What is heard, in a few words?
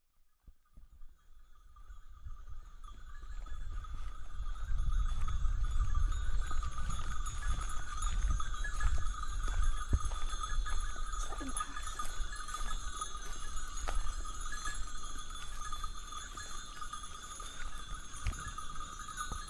sheep bells baradili sardinia